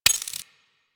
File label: Bard Rifle Reload; Weapons; Warframe; Tenno